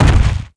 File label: walk, footsteps; earthshaker